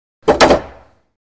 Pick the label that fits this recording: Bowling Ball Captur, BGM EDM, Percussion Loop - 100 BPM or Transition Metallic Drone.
Bowling Ball Captur